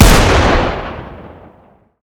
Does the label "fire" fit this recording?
Yes